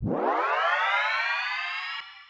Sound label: Advmap Appear